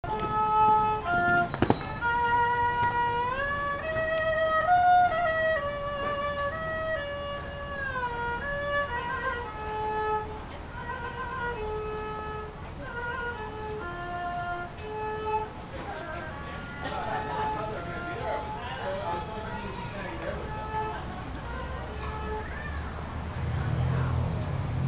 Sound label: Erhu Busker; Seattle WA